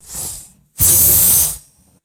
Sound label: small burst of compressed air